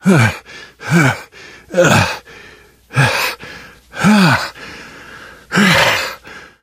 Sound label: exertion minor